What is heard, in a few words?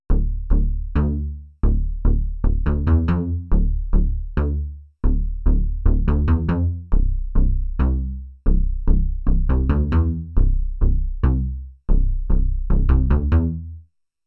Fat Line Bass